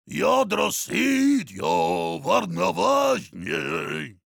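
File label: singing, German; Heavy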